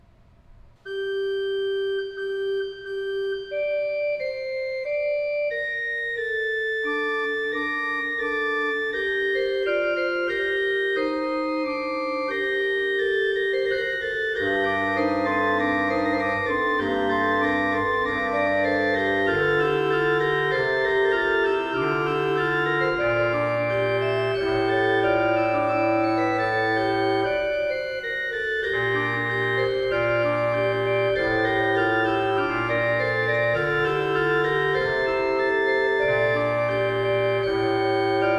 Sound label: Choral Prelude, Organ; ''Kommt her zu mir, spricht Gottes Sohn''; Johann Pachelbel; Complete Organ Works